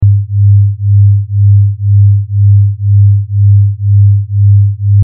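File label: binaural 100 102 hz sine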